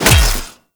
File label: Melee Hammer Impact Robotic Flesh; Hammers